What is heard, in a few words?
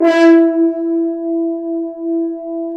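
BRS FHRN, French Horns Accent; Roland L-CDX-03 Disk 2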